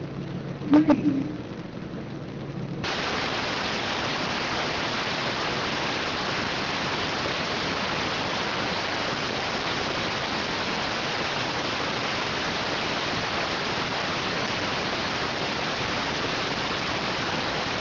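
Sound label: radioteletype receive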